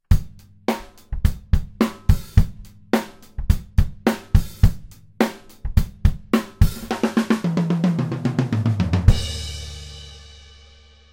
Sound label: Drum Overheads; earthworks QTC50